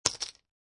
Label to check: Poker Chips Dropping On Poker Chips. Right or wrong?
Right